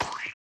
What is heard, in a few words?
Walking - Wet; Ed Edd n Eddy; Adromulus Pack